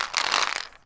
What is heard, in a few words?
Glucosamine - Roll, pill bottles